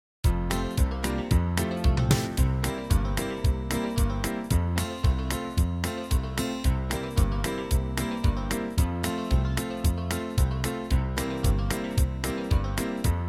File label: rag uptempo, fiddle; beaumont rag